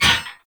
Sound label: Melee Impact Metal; Warframe; Weapons